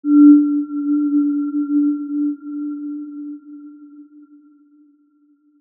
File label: Aurora E4 p; healing soundscapes; HSS-Custom-1